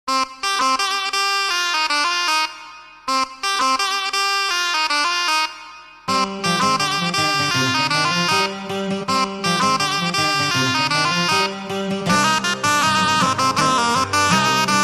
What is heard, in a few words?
Celtic Folk